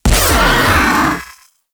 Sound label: Nullify Activate; Warframe; Teralyst; Ropalolyst; Abilities